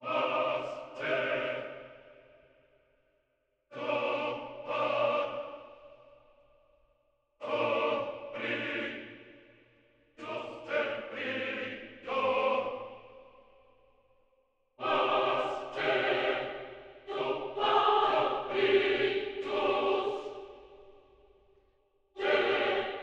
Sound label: Goddess Acappella; edge of eternity